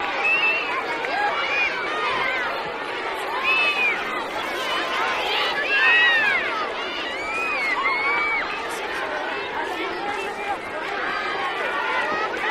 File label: Crowd Spanish Kids, kids play